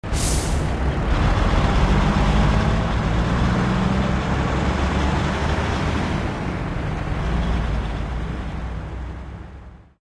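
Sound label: car one shot, harbor; Source Engine; tf; cp harbor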